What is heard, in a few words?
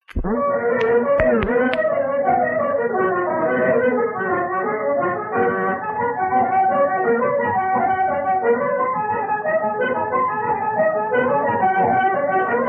The Cuckoo Hornpipe